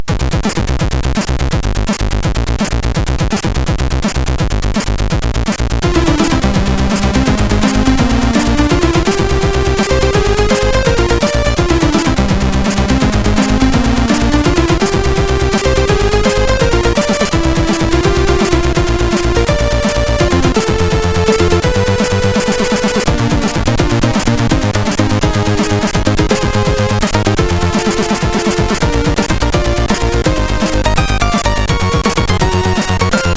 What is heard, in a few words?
sid, MOS6581R4; Hurrican